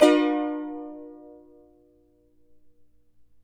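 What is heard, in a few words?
CHARANGO, C major, up; Spectrasonics; Hans Zimmer Guitars VOL-2; CHARANGO CHD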